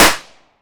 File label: confetti; gmod; arccw uc commons attachments